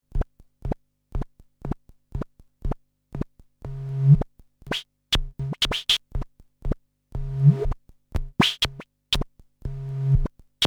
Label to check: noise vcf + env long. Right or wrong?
Right